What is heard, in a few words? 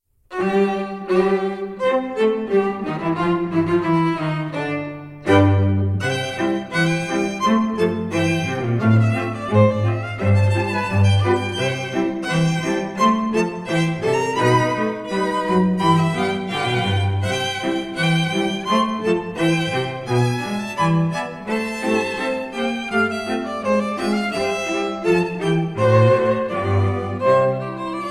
Waltz; Joplin